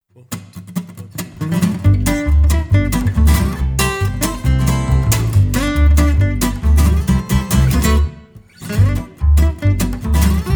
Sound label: Lasso Dance Instrumental